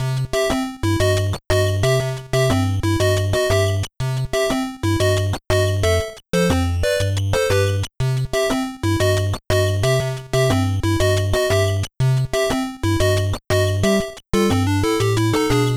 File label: 8Bit loop; PMD2; Spindas Cafe